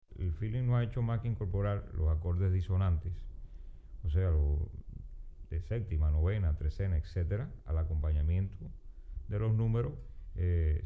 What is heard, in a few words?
Feeling, Tumbao